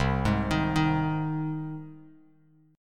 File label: C Minor Sharp 5th C down Bass Standard; arpeggiated; JGuitar